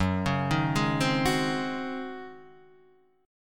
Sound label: C sharp Major 7th Suspended 2nd Suspended 4th, F sharp